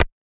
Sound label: keypress delete; android; effects; android 4.3.0